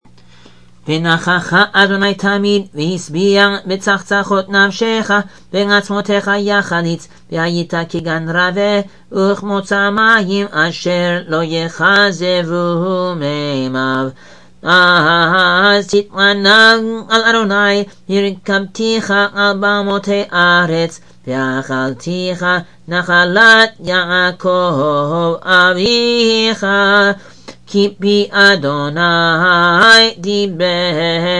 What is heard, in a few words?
VeNahakha Adonai Tamid Modulating from C Major to F Major